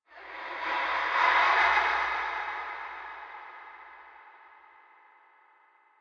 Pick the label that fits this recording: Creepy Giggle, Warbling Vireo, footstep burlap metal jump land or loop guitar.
Creepy Giggle